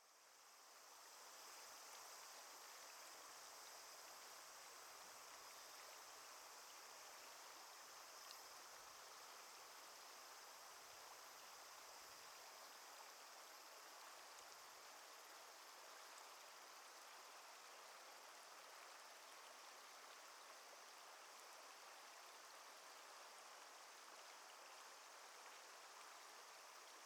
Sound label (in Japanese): せせらぎ